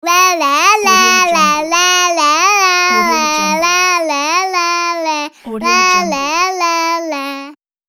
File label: kid teasing vocal